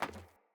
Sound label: Sandals Run Metal, Footsteps; Player